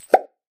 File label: notification reply